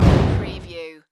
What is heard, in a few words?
Explosion Small; Action, Impacts & Explosions